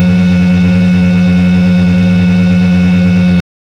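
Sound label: sci-fi racing engine scream, loop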